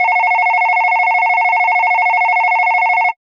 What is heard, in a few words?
Telephone; Roland BK-5